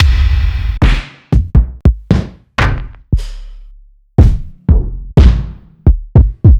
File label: 808 trap Kick Drums